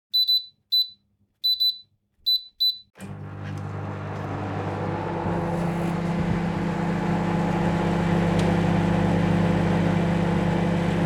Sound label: Microwave running soft humming; appliances